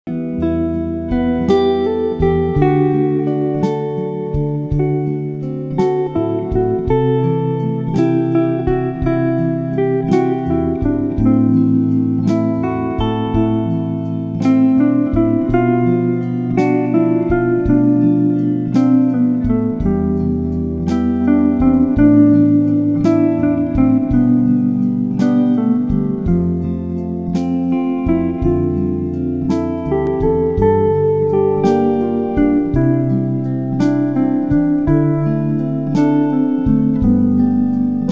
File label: a weepy song on guitar melancholic slow; music fx